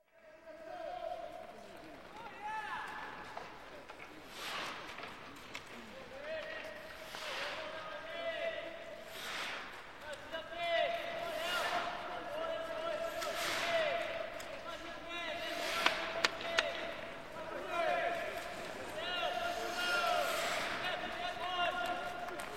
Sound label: Hockey Practice; Sound Ideas 2000 Series